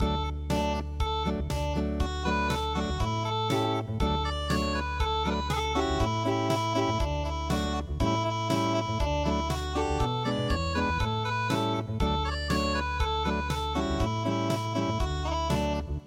unpluged; pony

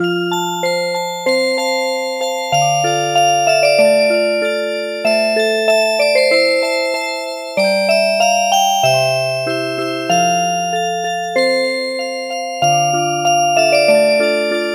Music Box; Holy Holy

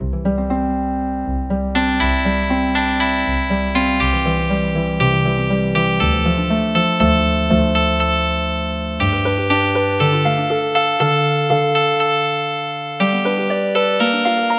Healin Harp

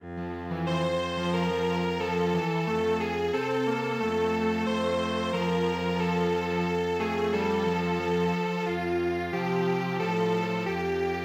Strings Quartet

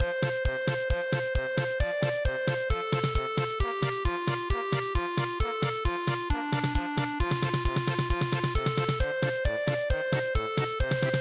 polka, midi; samoa

